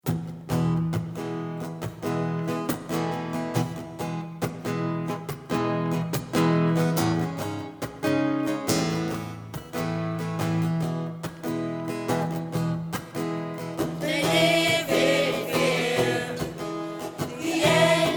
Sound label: keep those birds singing; Group A; save the house martins